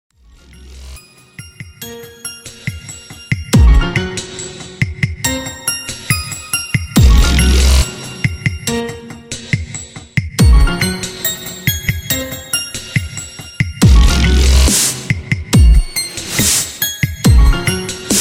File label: dubstep alien